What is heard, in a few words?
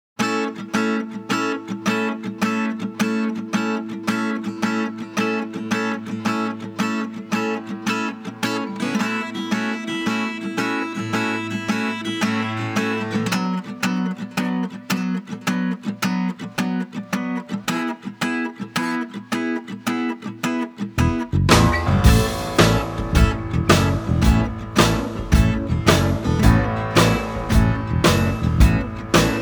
Instrumental